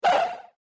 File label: lane shifter obstacle; Skillzgaming; mega Money Rush Desktop